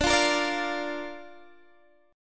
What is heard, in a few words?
D Minor Sharp 5th D down Guitar Open D